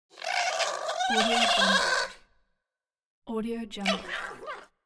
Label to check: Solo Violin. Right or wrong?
Wrong